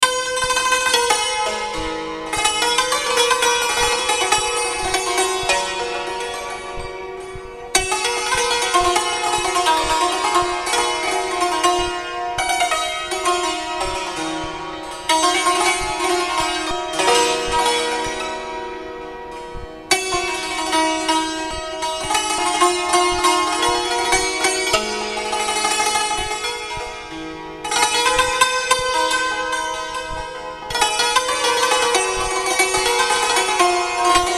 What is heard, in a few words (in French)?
iran santur